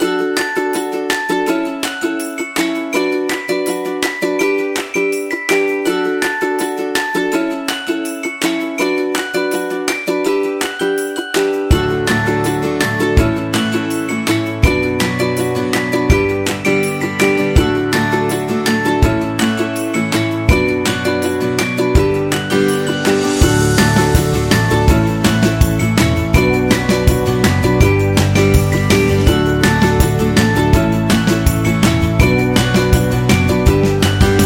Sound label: Playful, happy music; happy music vol 10